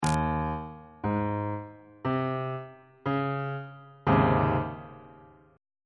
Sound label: c sharp major 7th suspended 2nd 1st inversion; bass clef